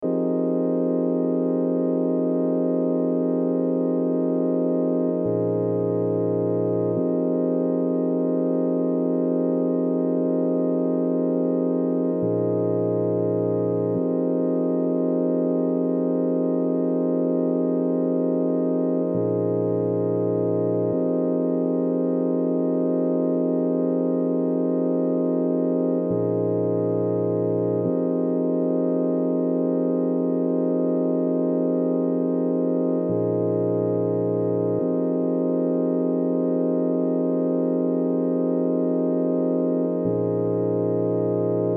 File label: ending pad; georgian